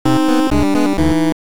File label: 8bit; norowareta